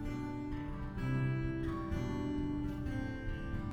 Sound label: Picking G, C, G, A7 66